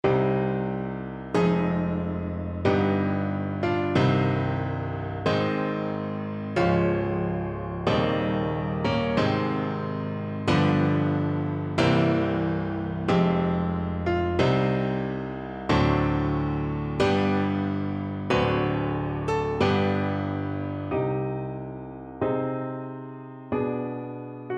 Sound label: prelude, piano; chopin